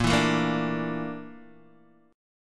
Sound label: A sharp Major 7th Flat 5th A sharp Guitar DADGAD